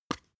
spice jar smooth open; industrie wirtschaft; gastronomie

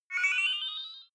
game start

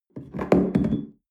espresso upset tablecloth medium; sport-freizeit; restaurant-cafe

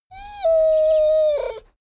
dogo argentino whine desperate; natur-tiere; hunde

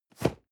cardboard box medium place slide; objekte

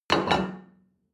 plate place table hard

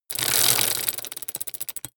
metal gear small spin rattle; industrie wirtschaft; metall

